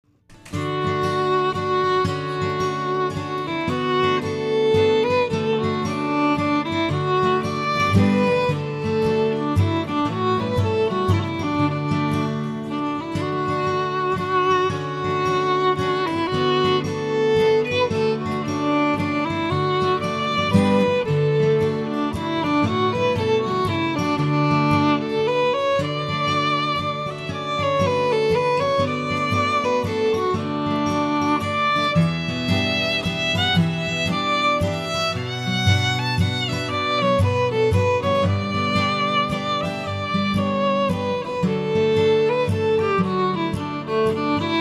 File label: midnight violin